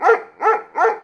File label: Dog Bark